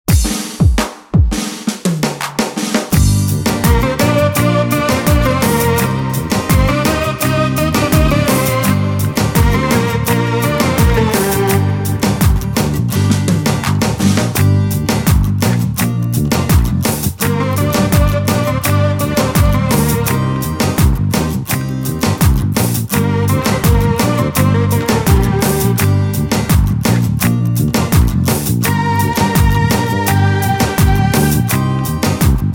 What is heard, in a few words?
Bikalam For Female